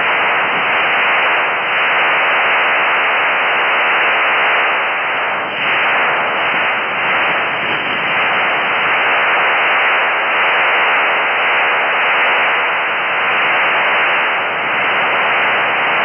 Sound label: stanag 4285, psk